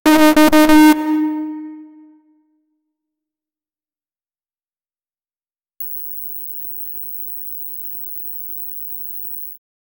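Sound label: japanese gameshow incorrect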